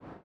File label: whoosh